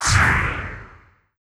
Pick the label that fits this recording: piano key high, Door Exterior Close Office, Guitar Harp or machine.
machine